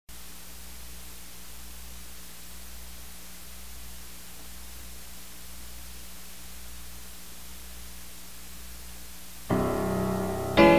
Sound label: PIANO